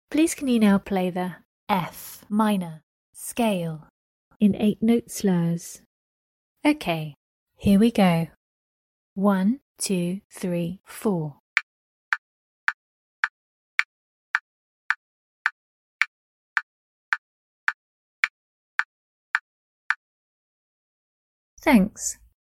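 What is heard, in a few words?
F minor scale 8 note slurs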